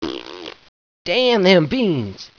fart; cowboy

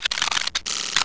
colts reload; wanted; weapons

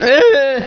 pain; wanted

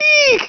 eek; wanted

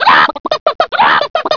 chick scream; chicken; wanted

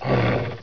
puma, idle; wanted

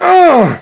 ugh; wanted

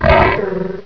die, grizzly; wanted

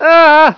arghhhh; wanted

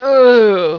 scream; wanted

